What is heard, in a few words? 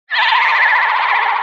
cursed scream; Curse Meme